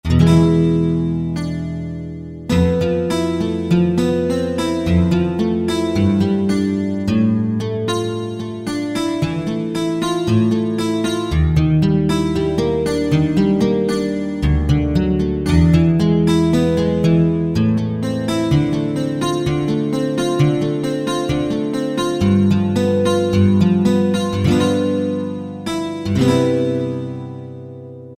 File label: Steel Ride, Alesis QS8; Factory Preset examples